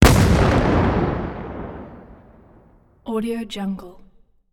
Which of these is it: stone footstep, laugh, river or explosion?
explosion